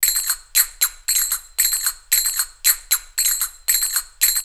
FingerSlippn’ 114, drumloops World Ethnic; X-Static Goldmine

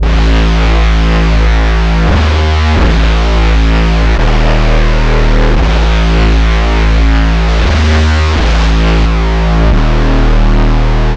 Hell Bass, Drum n Bass; KJ Sawka; Drum n Bass & Beyond

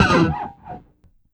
EFX SCRAPE, bass; Sampleheads; Ultra Freakin' Bass

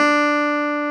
CLAV D 4, KEYBOARDS; Roland - LA Composer vol. 1

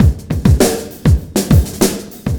Sky Lab Beat, Processed Drumkits

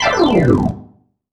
Slide, Organ, Loops; Sonic Foundry (Sony Creative Software); Joe Vitale Organ Donor; Slides

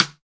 SIDE STIC, HR-16 DRUMS; EdgeSounds

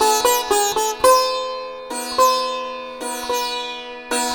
SITAR, Indian Traditions

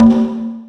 Big Conga; Zero-G Sound Sense Grime Scene; GS Kits

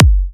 kick housey

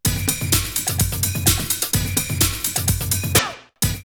Bongo! 127, drumloops Techno House; Bingo! Bongo!; X-Static Goldmine

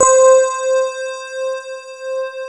keys; DCS KEYS

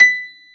PF, GRAND PIANO; AKAI S6000 CD-ROM - Volume 1

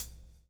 lash, backbeat

